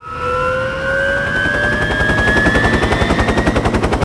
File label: apache start; Urban Camo Apache